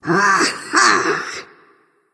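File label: nonverbal agree; smite; ratatoskr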